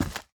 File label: Steps Dirt